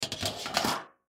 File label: Cookie Sheet Stack, Cookie Sheet Stack Metal; Kitchen; Household; Blastwave